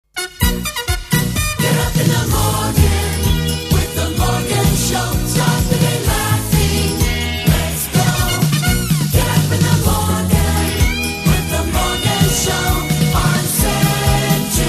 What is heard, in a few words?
jingles; A couple of John Morgan jingles from JAM from one of his 'wind-up' compilation tapes